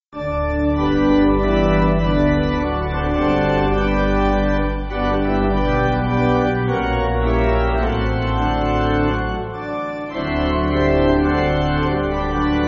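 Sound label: Pipe Organ, Free Music Backing Files for Churches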